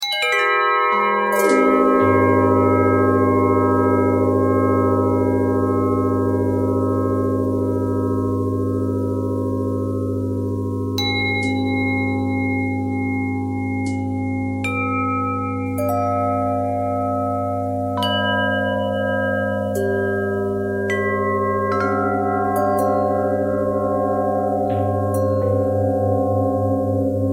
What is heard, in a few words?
bell bell thread pentatonic, bells